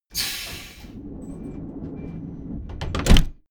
train intercity train door close

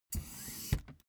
office chair hydraulics down

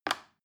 plastic button little release heavy

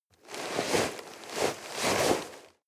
nylon leather walk

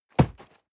box hit hard rustle

